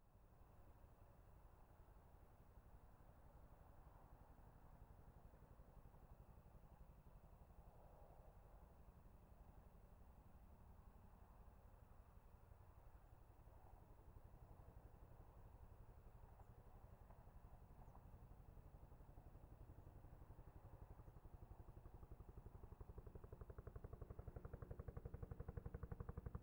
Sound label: Helicopter; Sound Ideas 6019